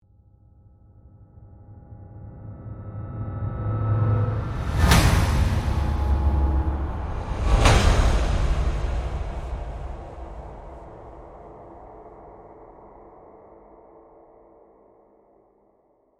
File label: Horror Hits Metal, Dark; Metallic